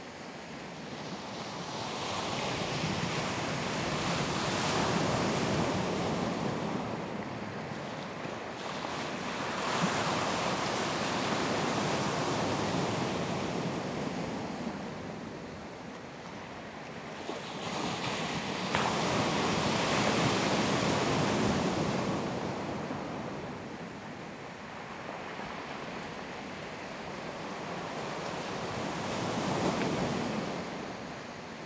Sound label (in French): extr mer St mandrier gravier calme plan moy